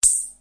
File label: Hats, Roland T6; DRUM MACHINES